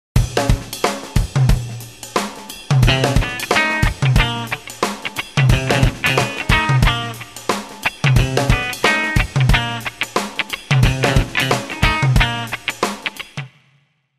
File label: Rhythm Guitar